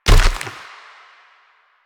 Proto Infested Runner Step Default; Warframe

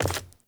Wood Step; Characters; Ambient; Steps; Sprout Farmers

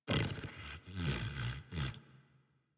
sleep idle snore fidget, Kubrow; Enemies; Warframe; Tame

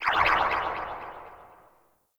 Corpus Ship Tech Chirps; Warframe; Corpus Ship Remaster

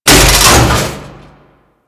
Loot Crate Open; Objects; Warframe

